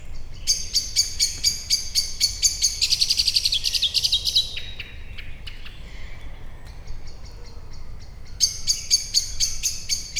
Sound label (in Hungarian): egyszercsak elrepul, fekete rigo; miskolc zoo